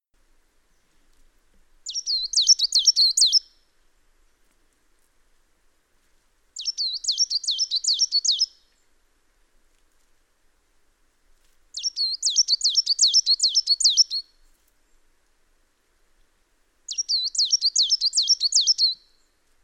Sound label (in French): mesange noire